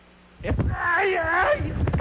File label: ayayyyyyy